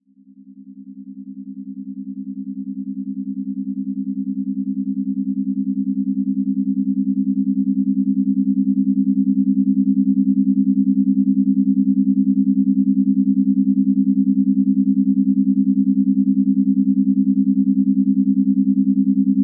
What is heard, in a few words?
Waves of Calm; RobinHood's AS Sessions